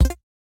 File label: ui down; Steven Universe - Beach City Drifters